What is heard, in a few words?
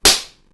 slap; apollo